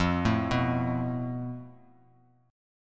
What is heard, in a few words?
F Major Flat 5th, Bass Standard; arpeggiated; x,8,7,4; JGuitar